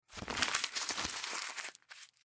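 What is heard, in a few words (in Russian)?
звук шелеста бумаги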